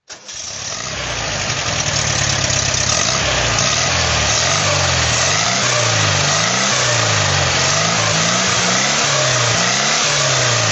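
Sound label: 356a throttle, Porsche 356 A convertible; 1957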